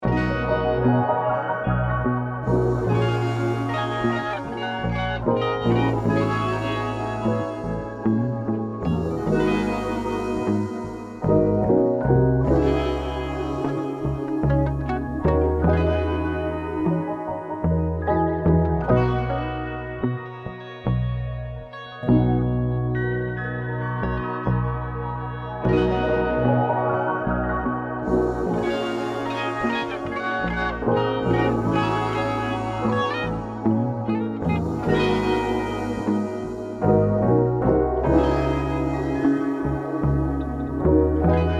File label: Coins, BPM 75, No Drums